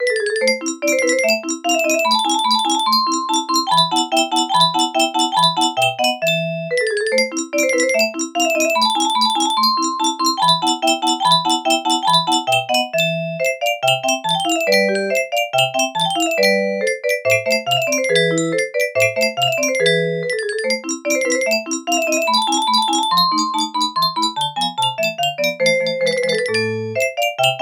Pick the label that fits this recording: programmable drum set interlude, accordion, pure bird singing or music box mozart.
music box mozart